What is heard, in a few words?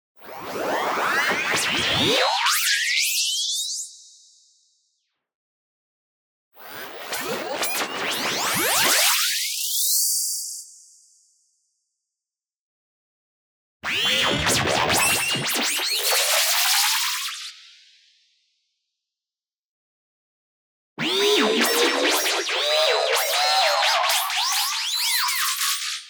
LASER Ascending Action Rise; boom library; Anime Essentials